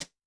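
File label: Brush Sweep, Yamaha DTXpress-II snare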